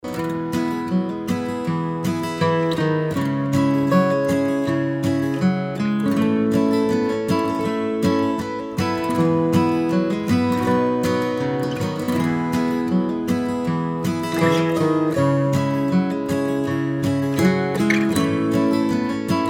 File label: Acoustic Guitar Rhythms